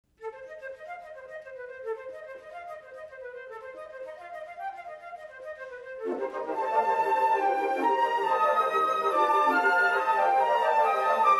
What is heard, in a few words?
sonata for flute choir